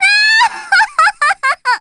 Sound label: cackle; lambda